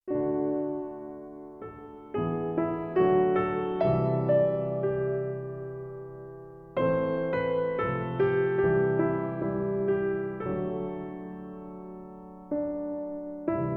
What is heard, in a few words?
solo piano